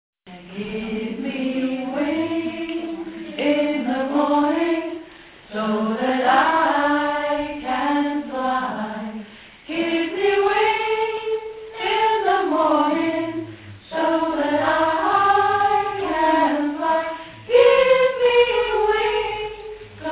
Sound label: alto